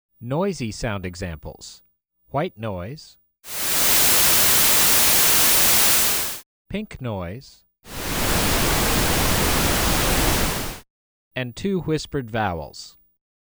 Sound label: Noisy Sounds